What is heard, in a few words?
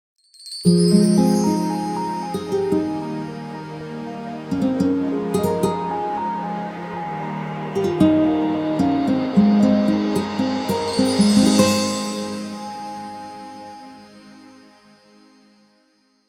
Clock alert, phonetones Nokia; Nokia E7